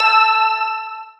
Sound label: Noise; Synthetic's Serum Collection; StiickzZ; Sticky Future Bass Vol. 2